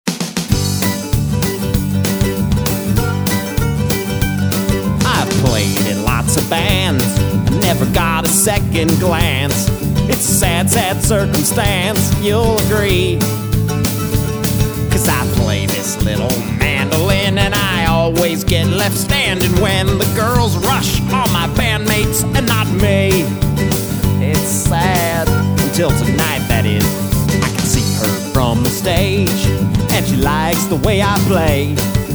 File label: mandolin